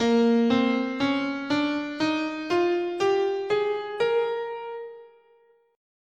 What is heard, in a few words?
Bb Bebop Minor